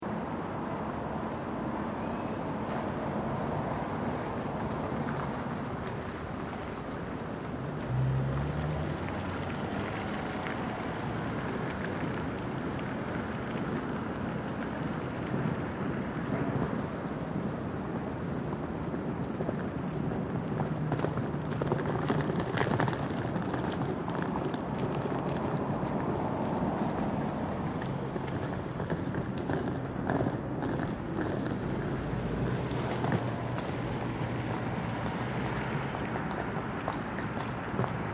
Traffic Calm Container, General city ambience. Cars pass by with people walking by; High heels mixed with footsteeps on light gravel on tarmac